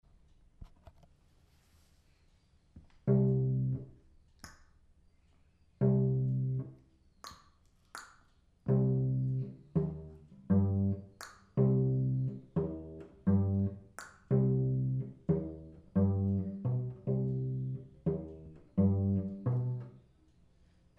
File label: Cello Solo